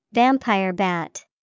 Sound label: Vampire bat